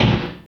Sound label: KICK METAL, Dance Kicks; Roland L-CD701; Dance K1